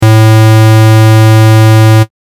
pulse

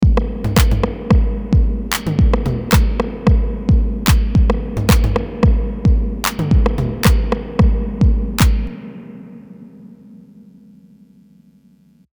909 Spring

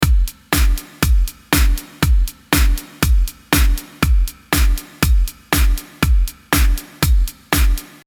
Drums

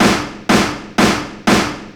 snare

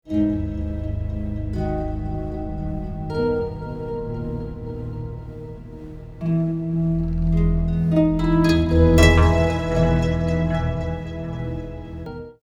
Original Two Octaves Down

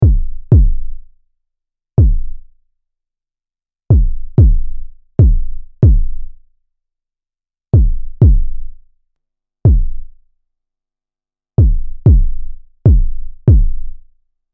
sine kick

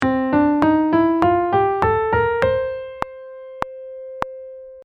bebop dor

